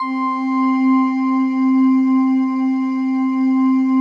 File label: PAD, DIGI-PADS